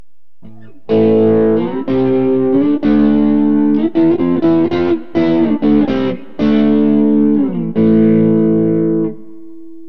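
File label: dragon neck, guitar pickup; burny; fugly clips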